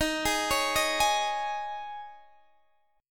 Eb 7th Suspended 4th, Guitar, Standard; Eb7sus4; arpeggiated; x,18,18,18,16,16; JGuitar

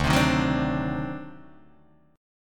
D 7th Flat 9th D down Guitar Open D

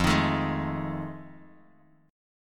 F 7th Sharp 9th, Bass Standard; F7#9 strummed

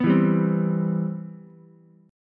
C Major 7th, C, down, Ukulele; CM7 strummed; JGuitar

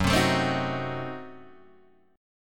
Gb Diminished 7th Guitar Open G

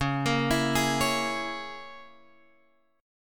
C sharp Minor 6th, Guitar, Drop D; 11,13,14,13,14,x

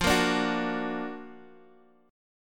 F sharp Augmented Major 7th F

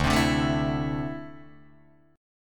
D 7th Suspended 2nd down Guitar Open D

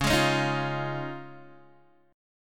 Db Major Add 9th, chord, Guitar DADGAD; strummed; JGuitar

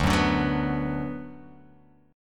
D 6th D down, chord

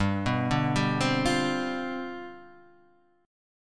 C Major 7th Suspended 2nd Suspended 4th, G, chord

